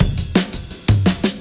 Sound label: Beat Style, 170bpm, breaks